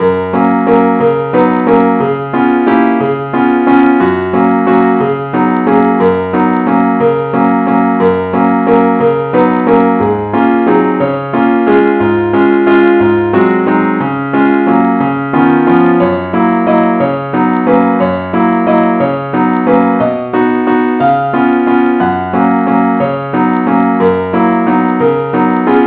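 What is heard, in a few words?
advance accompaniment key fis, keyb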